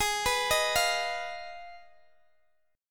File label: Ab Minor 6th Ab, down, Guitar, Open D